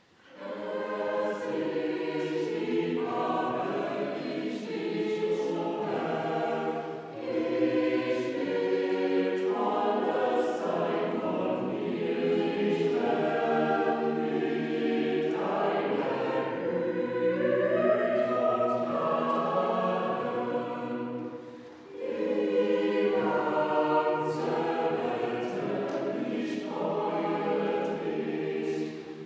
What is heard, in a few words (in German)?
Chor; Herzlich lieb hab